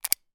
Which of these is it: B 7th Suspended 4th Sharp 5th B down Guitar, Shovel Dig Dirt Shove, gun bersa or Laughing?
gun bersa